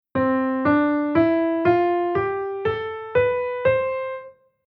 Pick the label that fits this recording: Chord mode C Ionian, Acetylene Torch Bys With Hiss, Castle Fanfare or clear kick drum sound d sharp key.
Chord mode C Ionian